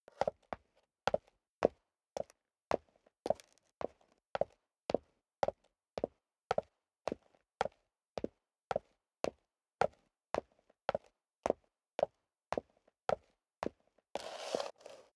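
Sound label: step high heels concrete upstairs sequence, footsteps; schritte; menschen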